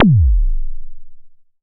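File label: kick, ARP 2600 Drums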